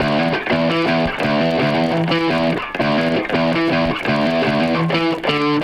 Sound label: guitar, loops; Phonky